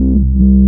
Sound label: combat scan loop, scanner; Half Life 2